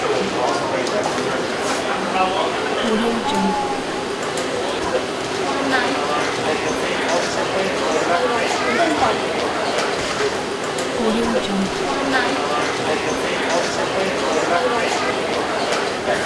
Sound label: airport security line ambience loop; kilmermedia